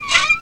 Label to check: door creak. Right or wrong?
Right